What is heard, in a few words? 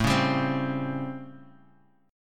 A 7th Sharp 9th, Guitar, Half Step Down